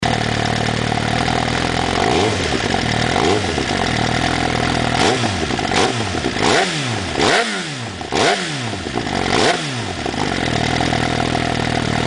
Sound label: Suzuki GSX-R1000